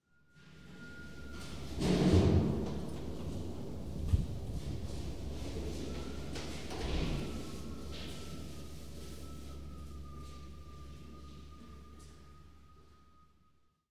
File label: Ambiance